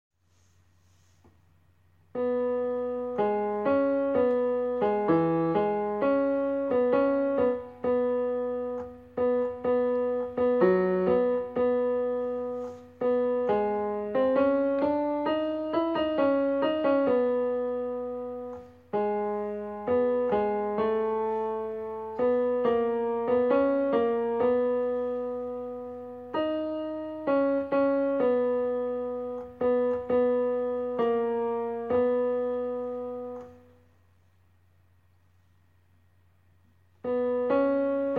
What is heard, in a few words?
hark the glad sound tenor plonked